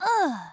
"eeuh"; pauline; SM64 CO-OP

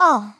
Uh; Teto; SM64 CO-OP; Triple Baka Pack

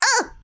"uh"; birdo; SM64 CO-OP

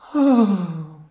panting cold; rosalina; SM64 CO-OP

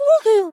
Hoo Hoo; Neru; SM64 CO-OP; Triple Baka Pack

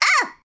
"uh"; birdo; SM64 CO-OP

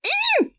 "euuh"; birdo; SM64 CO-OP

